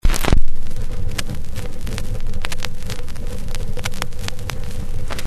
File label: SURFACE, VINYL